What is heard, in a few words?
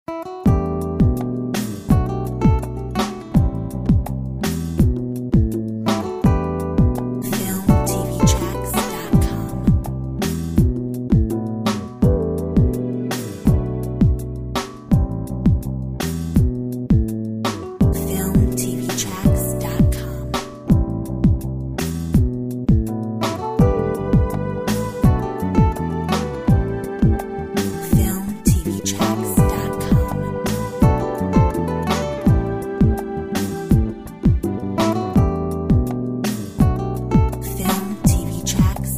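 soul guitar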